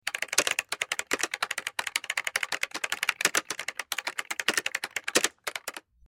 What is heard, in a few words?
Rapid Mechanical Keyboard